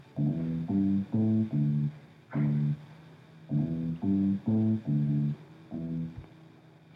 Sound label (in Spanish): bajo melodia